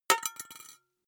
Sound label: glass metal small drop